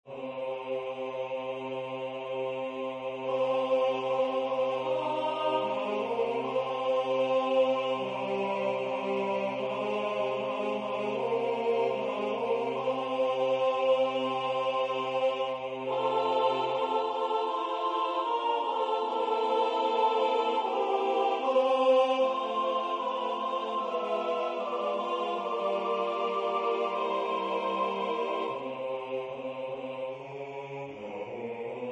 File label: SSATB; Stemning